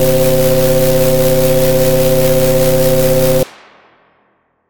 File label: Boom; Salamander Boom